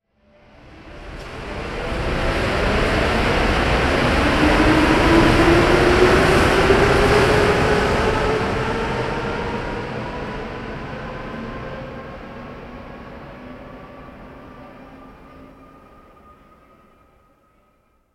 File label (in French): metro